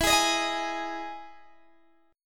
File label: E Major 7th E down Guitar DADGAD